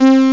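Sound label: synth brass 3, tones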